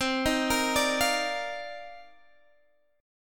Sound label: C Minor 11th C Guitar Drop D